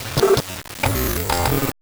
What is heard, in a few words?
TECHNO FX 140